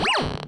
reactor cap unready; starsiege

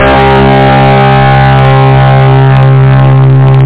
metal, guitar; da capo vol1

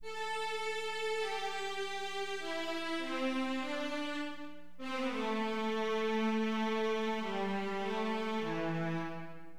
STRING, Synth; LiveAct Pool; AcidJazz Funk

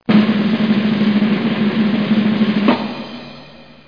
DRUMROLL; LOTUS

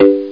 Reggae G; Sound Library 2000; Wayzata Technology

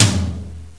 Room Tom; GMPlay; XG

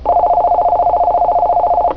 Ring

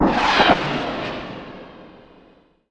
Rocket V2; ImpGal2; groundwar

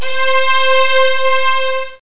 strings (high); Octamed 6; walkabout

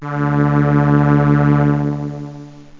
arpsolina organ drone, strings; Zoom - Release 2; Active Software

